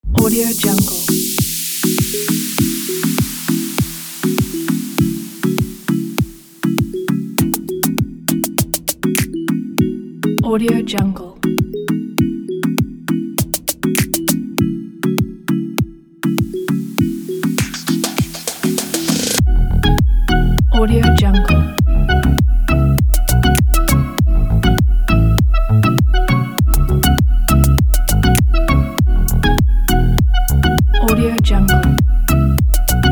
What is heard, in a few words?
chill waves house